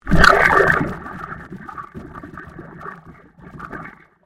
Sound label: Convolution Surge